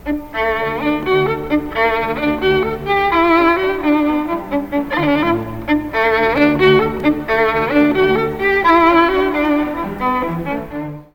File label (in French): Concerto pour violon 3ème mvt; Beethoven